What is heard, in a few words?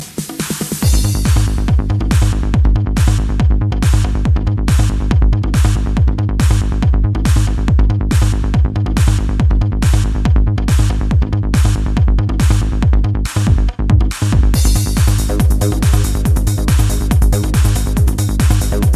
Super Electro Beat